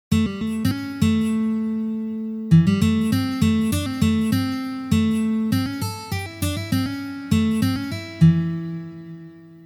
Blyuzovye liki i uprazhneniya

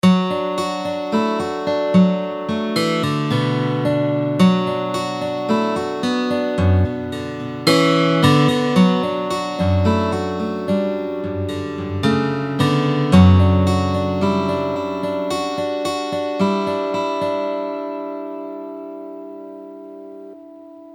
vstuplenie

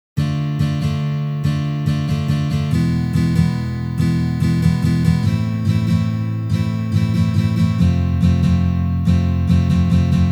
Valsovyj boj na gitare

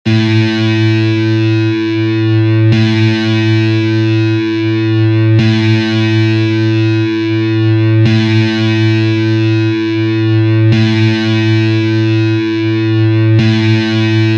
struna Zvuki strun elektrogitary

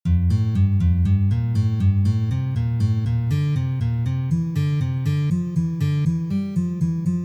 Uprazhnenie dlya igry gammy Re minor

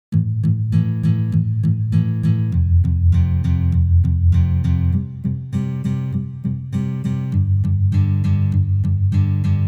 Palm Myuting

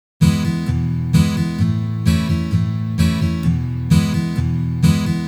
Boj Kantri na gitare

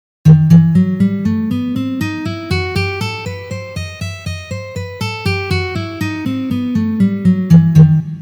Gamma Mi minor garmonicheskij vid pozitsiya

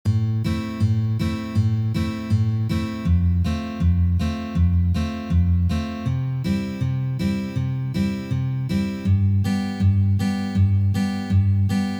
perebor na gitare Prostoj universalnyj

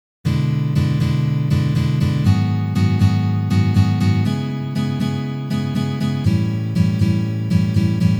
Uprazhnenie c akkordom E7

